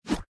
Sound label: player jump; Adventure Time - Break The Worm; ThrillGames